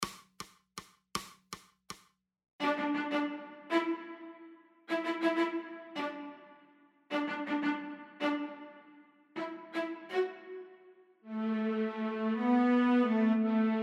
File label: wurligig waltz